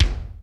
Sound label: ROOM K, ROOM KITS; Crypton - DrumBase5000